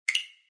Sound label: mouse click